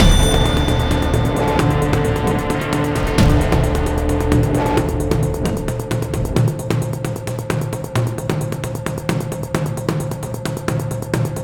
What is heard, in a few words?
Suspense